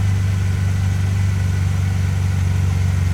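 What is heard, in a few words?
Ferrari Testarossa Idle Loop, Automobiles; SFX Kit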